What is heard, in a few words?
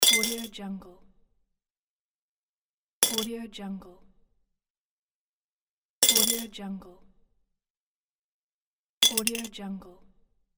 coin drop into jar of coins